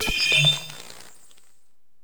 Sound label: ui appear; Half Life Alyx